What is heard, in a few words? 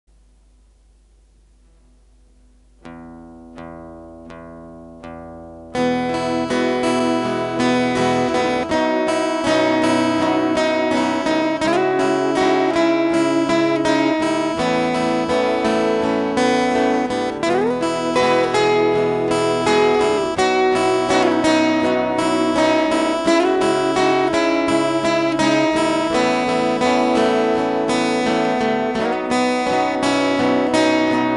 An Acoustic Plod (Acoustic Arpeggiato)